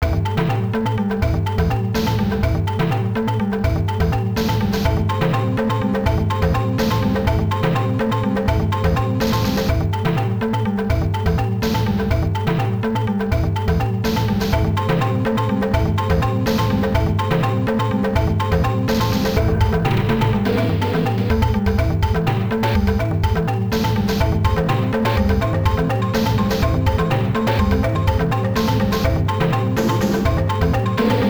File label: Match BGM; Tao Taido arcade